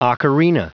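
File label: ocarina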